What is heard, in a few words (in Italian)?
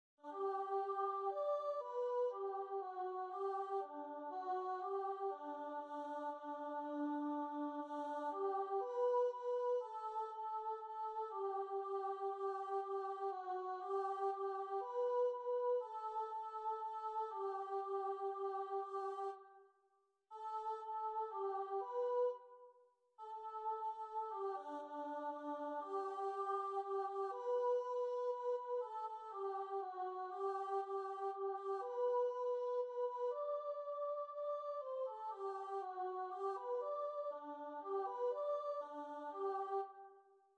coro contralti; Idomeneo